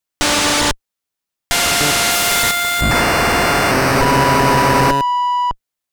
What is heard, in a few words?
noise noise